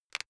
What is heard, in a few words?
plastic pressured short soft